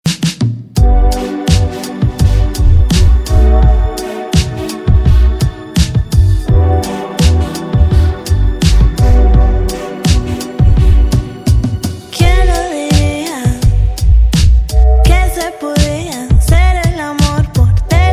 English Ringtone

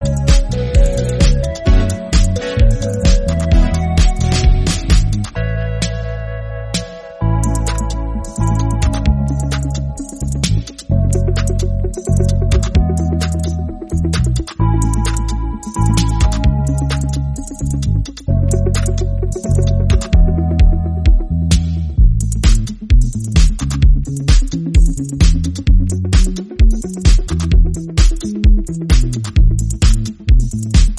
Ringtone; Oppo Find N2 Flip

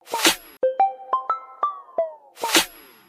OnePlus 12 Sms Ringtone

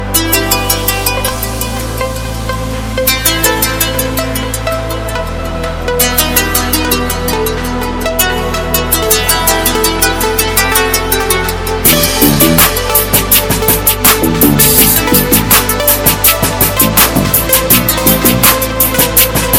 Samsung A55 Ringtone

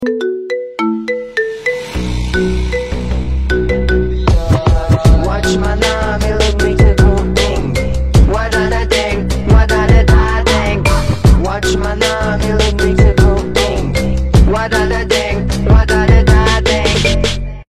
iPhone Remix

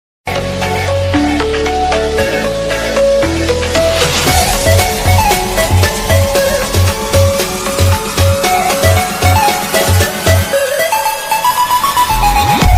English Ringtone

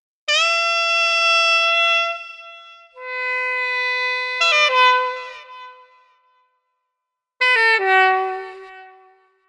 Vintage Sex loop Ringtone

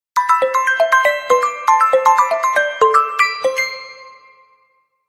Remix Ringtone For iPhone

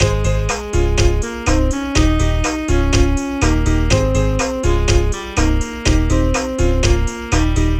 Tropical Beat Ringtone

